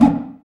FX Dance; Sound & Vision; Gigapack II CD 2 (Roland)

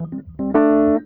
E.PIANO, KEYS

